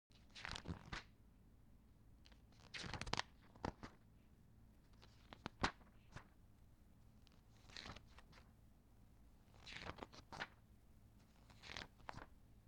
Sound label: Flipping Through Magazine